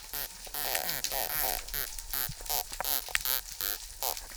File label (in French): Langouste, chant de la langouste; Perros; écouter le chant de la langouste un soir au clair de lune